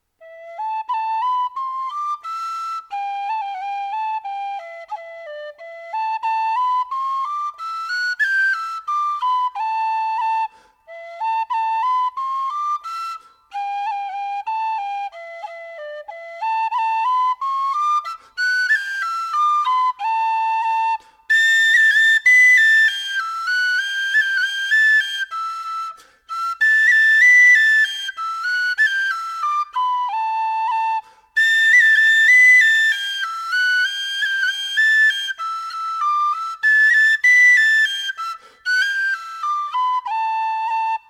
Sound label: Ballydesmond Polka